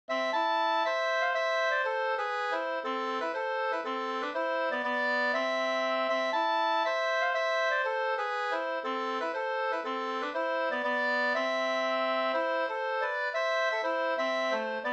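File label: polska, two-part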